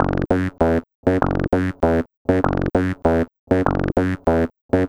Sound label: Squibble, Bass; Sonic Foundry (Sony Creative Software); Synchro Funk